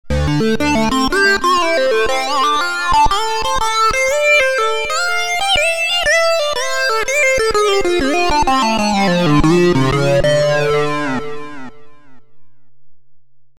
OB Sync Solo; PRO12; opxpro2

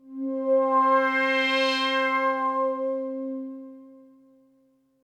Sweep; opxpro2; Riddle

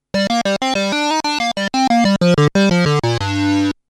sync solo high original

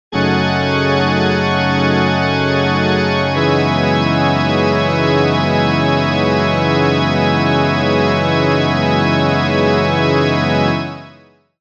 organ chords; stringer

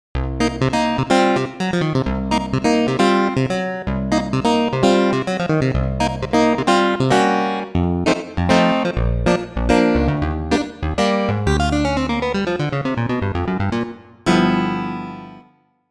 Clav, Jupiter8; OPXPROII; opxpro2

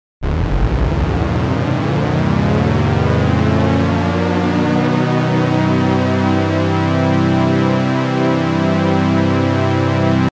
portamento reaktor

